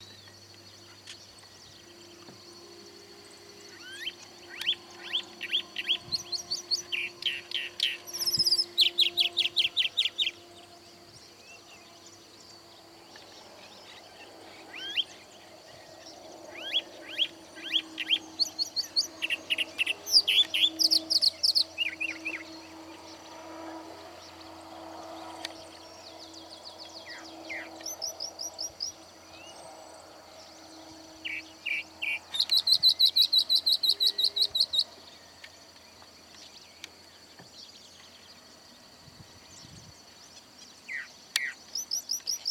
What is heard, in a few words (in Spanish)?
calandria voz; aves